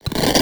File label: percussion oneshot wakeup; Pop; Drums; SD PASADENA Pop Sample Pack; Slate